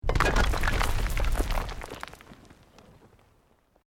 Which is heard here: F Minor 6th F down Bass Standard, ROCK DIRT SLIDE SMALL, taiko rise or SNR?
ROCK DIRT SLIDE SMALL